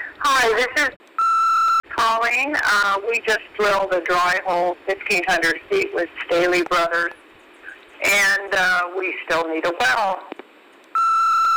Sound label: Drilled 1500ft